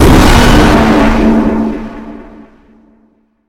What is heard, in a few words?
shadow jumpscare sound; five nights at chucks 3